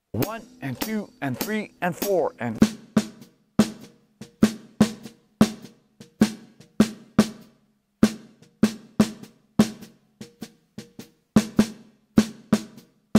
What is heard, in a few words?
Melody Swung 8ths